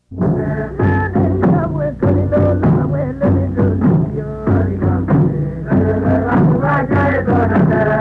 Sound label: Natives